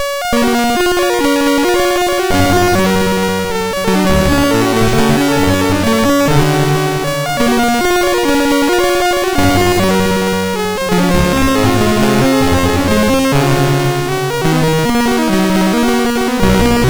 Randomly generated music BASIC, sid MOS6581R2

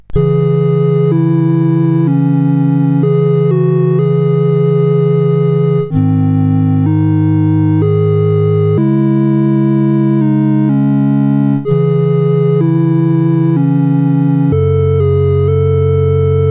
CSG8580R5, GAMES; Woodpecker

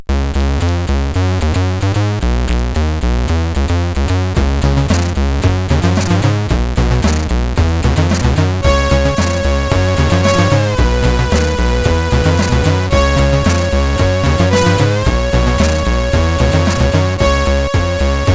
sid 8580; Hyper Cars

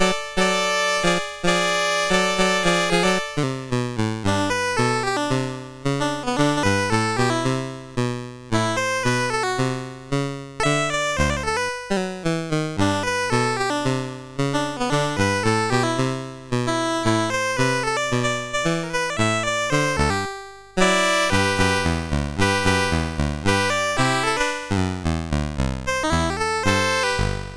Jingle Bells Jazz, CSG8580R5; Christmas

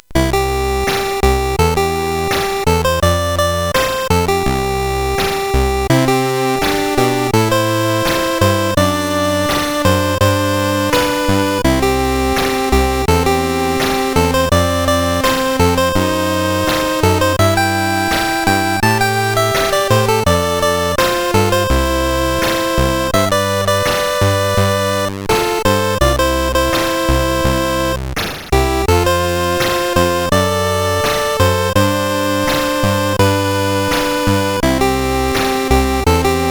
Synth Sample, CSG8580R5; hvsc

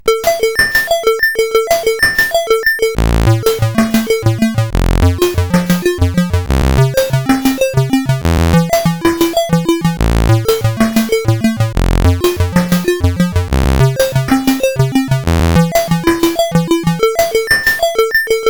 sid, CSG8580R5; Song in Lines

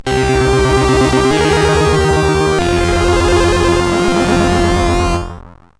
sid 8580; Wozzle

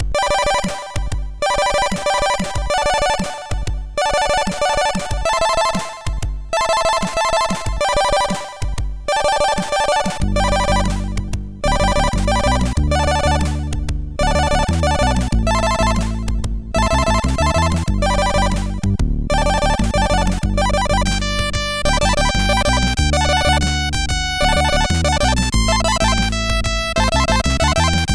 Intro, MOS6581R4

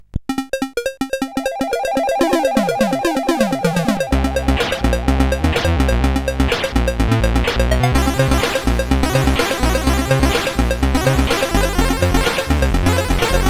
Subbuteo, CSG8580R5

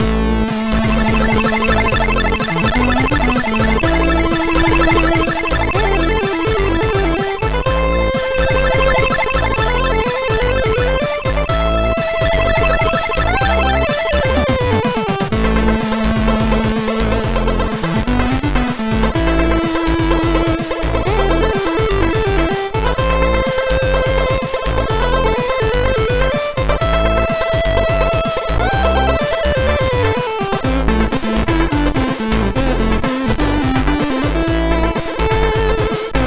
sid MOS6581R3; Planet Carsberg

